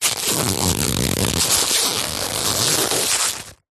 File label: foley plastic stiff packet small handling squeezing